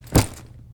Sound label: Luggage Drop